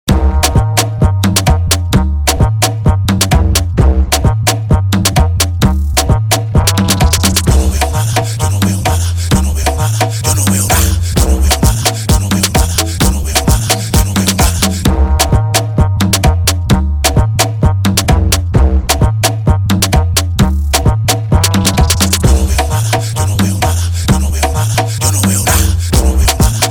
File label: DEMBOW INTRO BPM 130